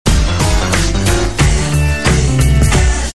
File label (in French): Jingle; RTL